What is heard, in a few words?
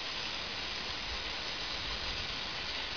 steam; Xmastown